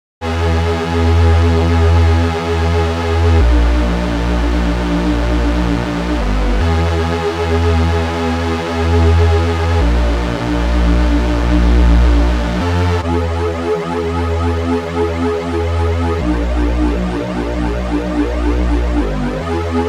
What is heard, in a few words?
Hoover Synth; Eternal